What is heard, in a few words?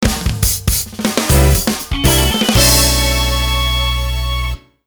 jingle